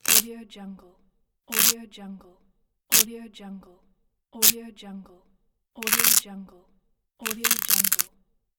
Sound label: velcro; fx library